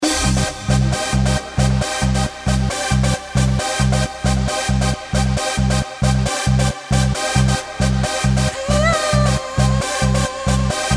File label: Rave It